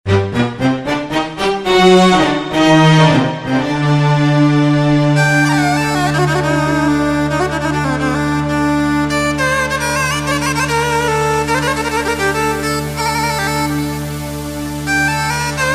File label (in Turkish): taksim keman yamaha psr 9000